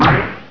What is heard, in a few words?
DROP MINE; ARMRCMD